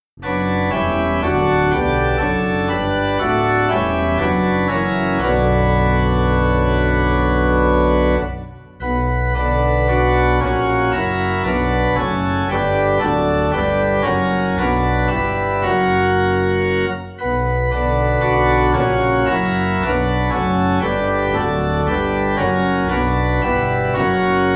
God Rest Ye Merry Gentlemen - Organ Low in B Minor; christmas